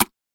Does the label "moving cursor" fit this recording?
Yes